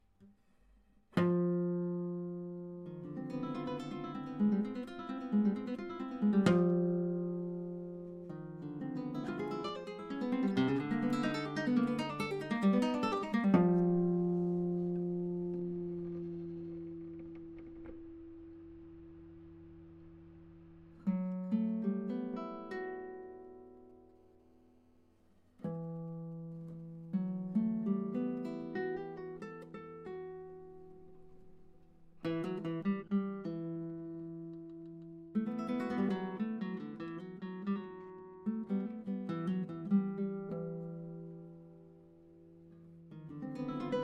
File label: paisaje cubano con campanas guitar; Leo Brouwer